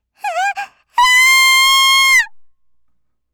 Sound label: kyaa; gaya